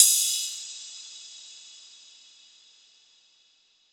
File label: cymbal cavern; Drum One Shots; SD MIDNIGHT Sample Pack; Slate; Cymbals